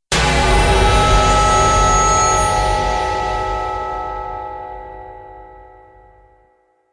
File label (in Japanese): 和風心霊特集ジングル; 和風心霊特集ジングル2; ホラージングル